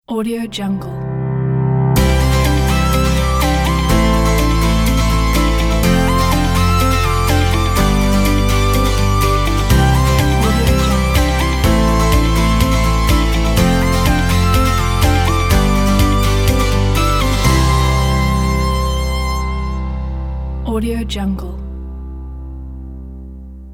corporate pop logo; enjoylife

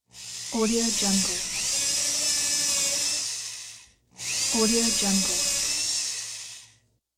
drill

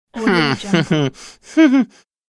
cartoon peasant embarassed shout

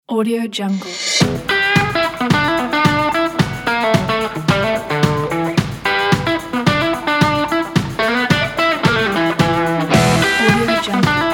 rocking country

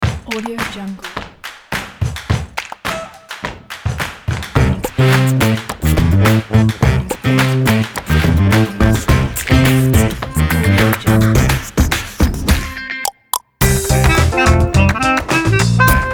happy groove

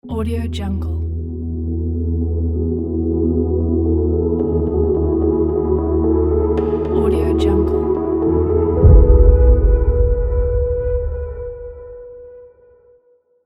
gentle dream logo

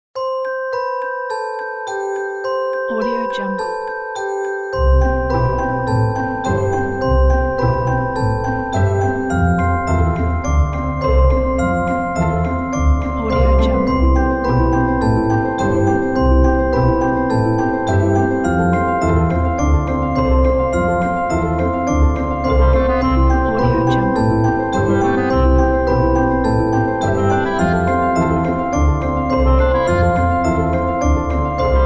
clockwork toy

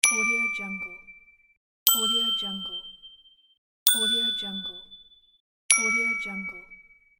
bling

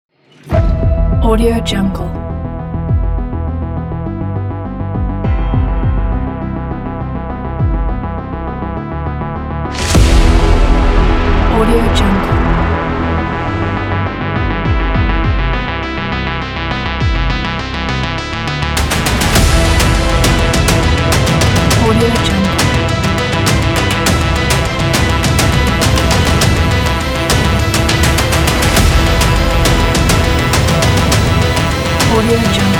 epic action scifi cinematic; intelligentmusic

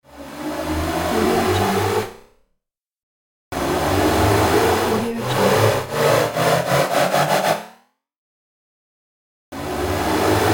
car sound

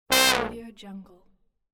cartoon brass fail

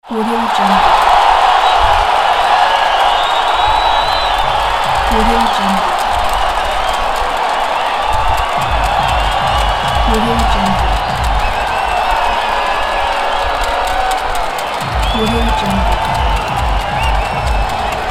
soccer match stadium ambience

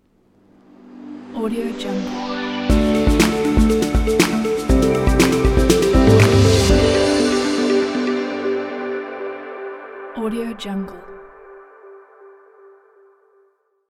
trendy logo intro; viktorkurando

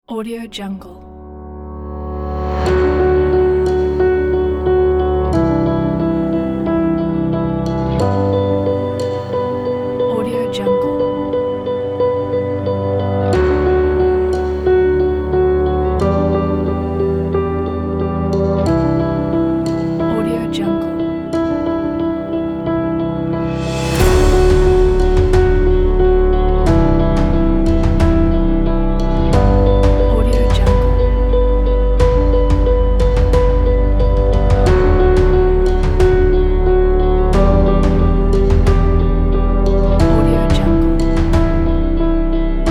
ambient piano

